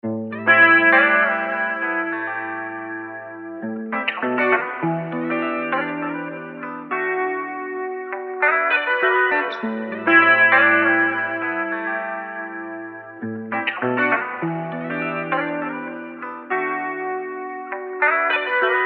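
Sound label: bouncy, E minor, 100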